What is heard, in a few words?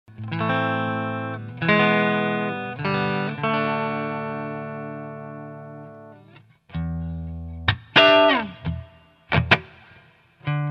6L6 viola ampli; SanGregorio